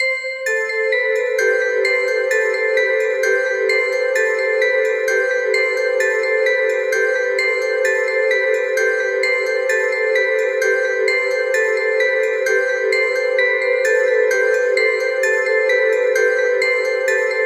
Bell Arp Short Am 65Bpm; STEMS; Ghosthack; SkyRealm; Kit 27 - The Crossing Of Jade Sea

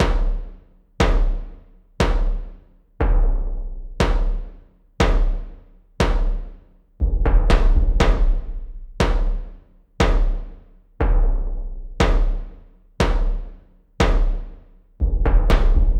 Bassdrum 120BPM; Death March; Ghosthack; Kit 01 - Death March; Percussion Loops

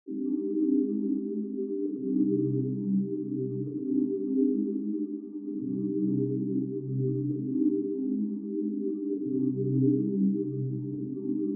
132 BPM C Min Pad, melody loop stem; Trap; Loop Stems; Cymatics; 2022 Melody Collection - Trap Pt 1; Frequencies

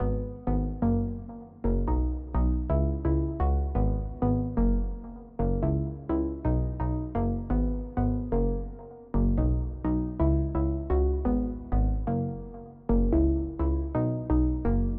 128 BPM G# Min Chords; Loop Stems; Cymatics; Breakdown Loops; Fuse

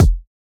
Kick - F, Hip Hop Drums - One Shots; Cymatics - 9God Hip Hop Sample Pack

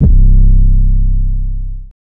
808 (C); Attention; Cymatics; GHOST (Beta Pack)

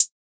Blitz Hihat, Closed; trap; Hihats; Cymatics; Trap Starter Pack

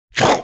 Wet Mouth Hit; Vintage Cartoon; Comedy Vocals

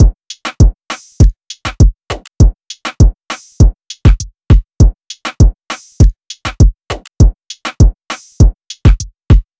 Drum Loop - 100 BPM, Hip Hop; Drum Loops; No Se Nada; Cymatics

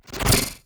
Jolt Standard

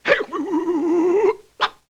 LoFi Gurbles; Vintage Cartoon; Comedy Vocals

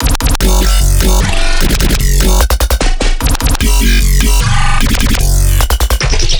Bass Loop F# Signal, Dubstep, 150bpm; Ghosthack; Dubstep Sound Arsenal

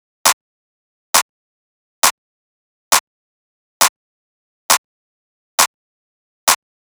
Clap Loop 135, Techno; Ghosthack; Techno Sounds 2025

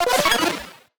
Retro Portal Crossing FX; Video Game; Cymatics; Video Game Sample Pack Vol 1

